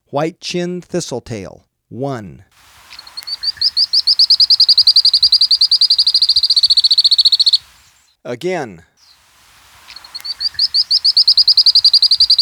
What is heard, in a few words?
White-Chinned Thistletail, Birds of Ecuador; S. America